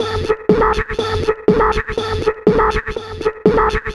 Vocoder; Electronic Point Blank

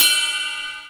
JV80, Roland JV80, Drums

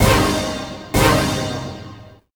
STAB, Grunge Hip Hop; USB Soundscan vol.34; Burning; AKAI

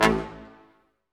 BRS Quintet staccato; Roland L-CD702

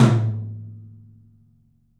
OPEN TOM; 3D Sound; 3D Drums; 12 TOM

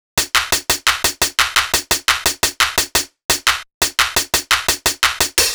Hi-Hat Clap Combo, Hi-Hat Loops

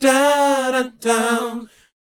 BOYZ G, Vocal Planet R&B & Dance; ILIO

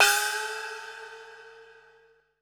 GONG OPRA, TIBET; Best Service Advanced Media Trax Modern Composer; ETH TIBET

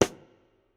Kit, breakcore Drum Hits

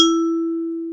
E-3, VIBRAPHONE; AKAI S-Series CD-ROM Sound Library VOL-7

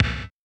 Boss Dr Pad Kick; 1987; Boss Dr Pad Sample Pack; 300 Drum Machines

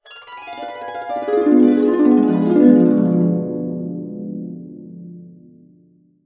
D DIM7 CA DN, CASCADE DN, Complete Harps; USB Soundscan vol.62; AKAI

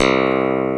HONNER C2, Keyboards Old School; USB Soundscan vol.09; AKAI

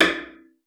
CELLO FINGER; 90 s Sample CDs; Xsample VOL-5

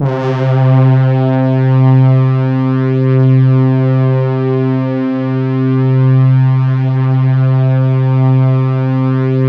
C3, Synth Line; 90 s Sample CDs; NiceBeats; SynthLine VOL-26

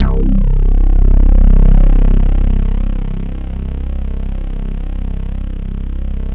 MEGA, synthesizer bass; Synthesizers; Soli-Music; World of Synthesizers VOL-1

